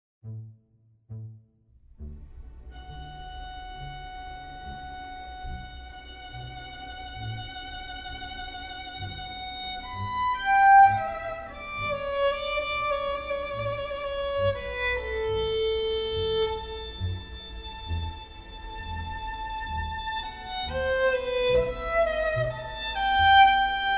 Aria, violin

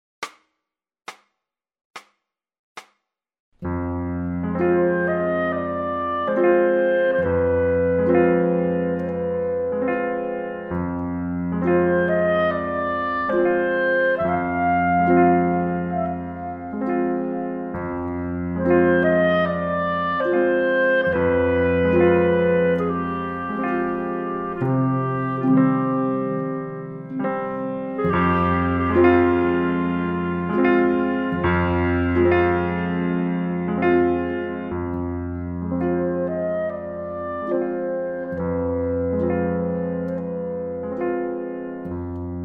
piano solo referencia clarinet

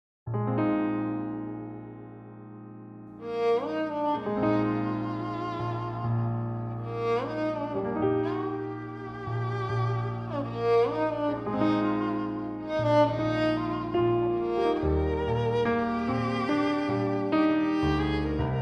Eb piano play violin reference

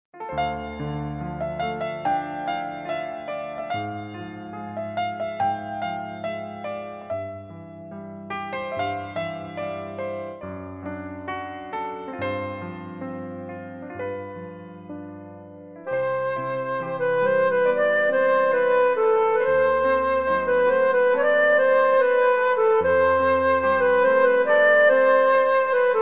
C play flute referencia

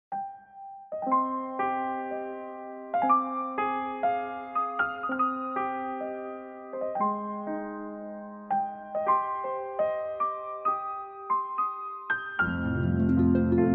Cm play reference violin

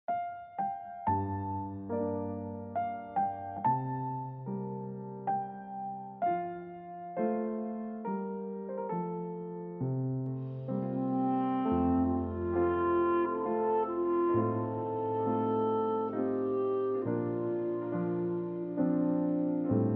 play reference clarinet

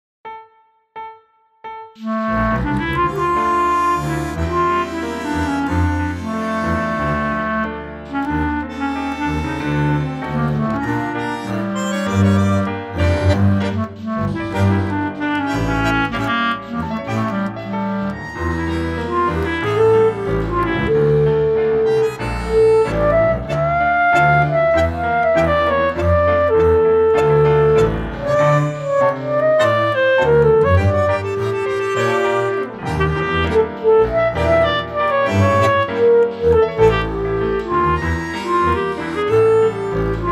Dm, clarinet

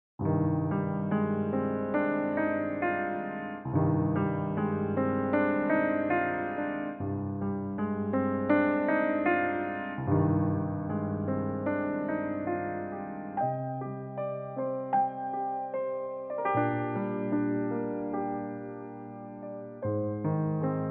piano

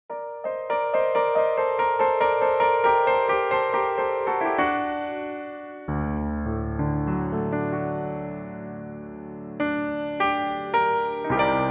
piano play; Howls Moving Castle

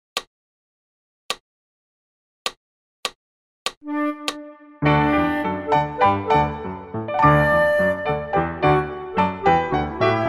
piano play flute

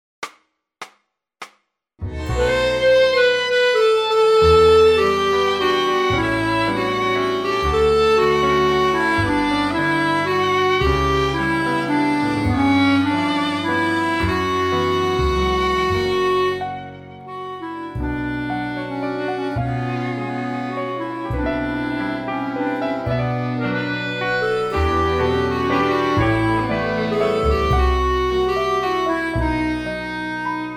clarinet, Bm

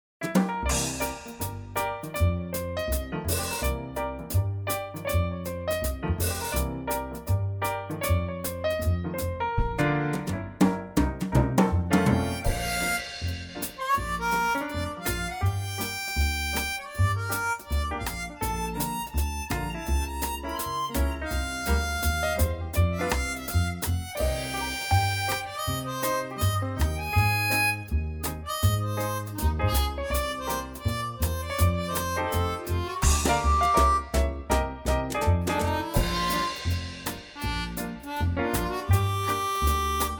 Bb, armonica

